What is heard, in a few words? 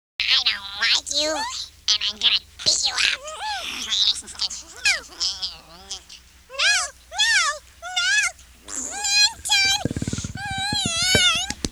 Beans (Solo Acoustic)